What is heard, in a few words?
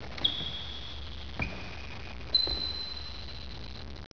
SQUEAK